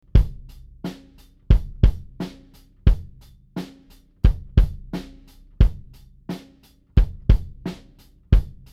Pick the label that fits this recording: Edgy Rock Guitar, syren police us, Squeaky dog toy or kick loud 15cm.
kick loud 15cm